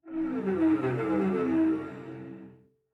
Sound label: Cello Slide Down; SoundsCrate